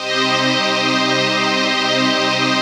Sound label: PAD, Dance Mega Synth; Best Service